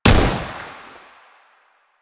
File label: AK47 Fire; Warframe; Tenno; Weapons